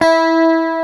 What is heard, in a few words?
E5, CHRS GTR; East West - Global Sounds